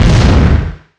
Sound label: grenade impact